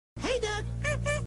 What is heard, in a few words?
Honk; Skeeter